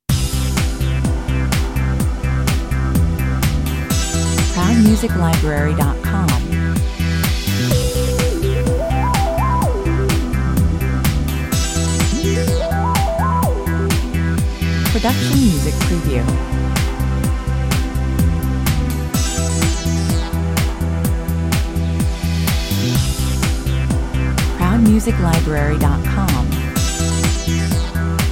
smooth and rich electronica for TV, documentary with quirky variation in middle section, 126 bpm